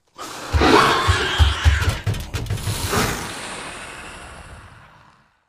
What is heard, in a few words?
Alien